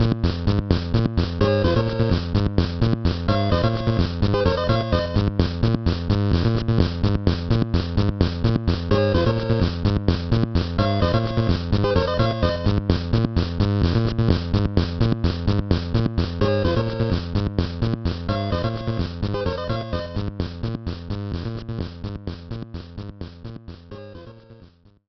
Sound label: SFX Arcade Game; ACNL